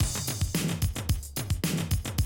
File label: 110, Classic Drum Loops